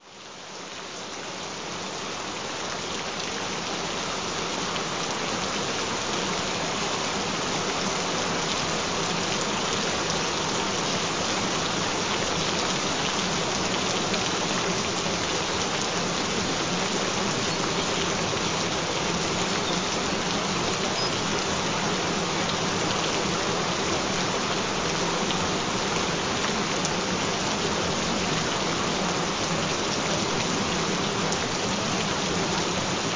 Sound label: Gentle Rain Sounds; Healthy Cardiovascular and Circulatory System